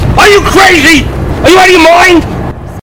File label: meme loud